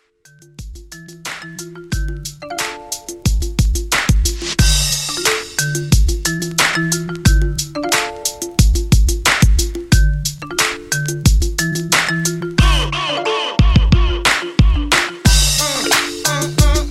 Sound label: Workout Hip Hop 90 Bpm